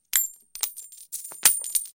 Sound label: Coins Falling Coins D